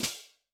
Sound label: Cymbal, Yamaha DTXpress-II